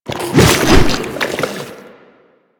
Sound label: Infested Ancient Strike Flesh; Warframe